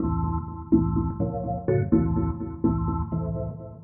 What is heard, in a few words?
DEEPHOUSE ORGAN 125 A